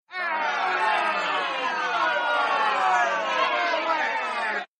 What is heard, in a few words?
booing crowd